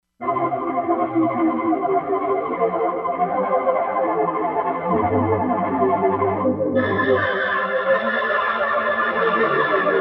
Choir, Korg MSC-16S; Korg MSC-16S Environment

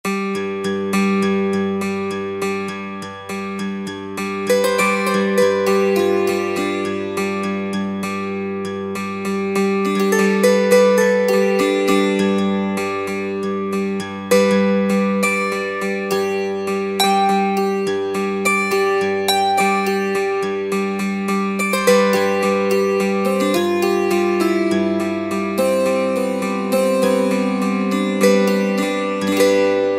DULCIMER; Sonic Reality American Heartland

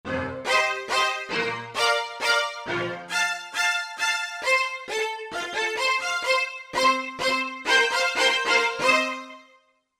Spicat Str., Korg MSC-1S; Korg MSC-1S Variety

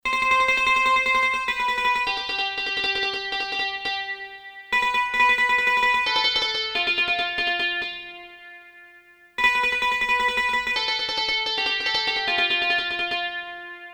Mandolin, Acoustic Guitar; Korg DSS-1

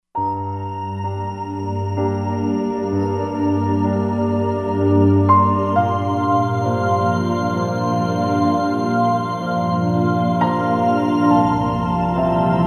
Piano; Arie Piano; Roland Fantom XR

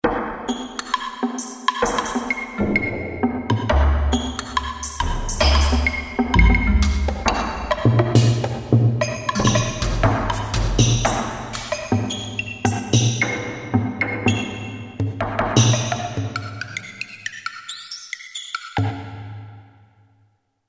Woody Jam Rack; Kurzweil K2000 Orchestral ROM

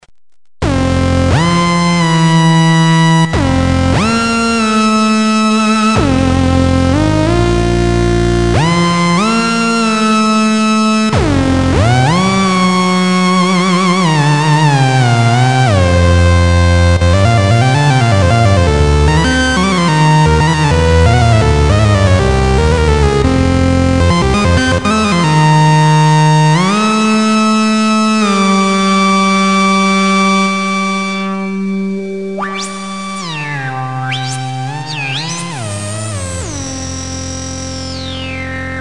progressive lead, Realistic MG-1 Concertmate

